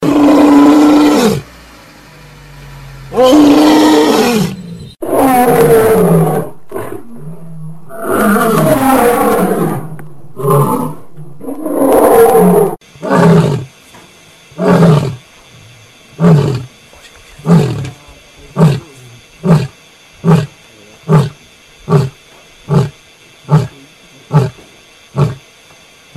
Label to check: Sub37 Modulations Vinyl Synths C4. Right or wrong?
Wrong